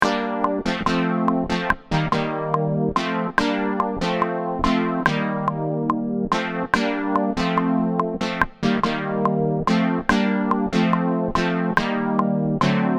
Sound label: B Chord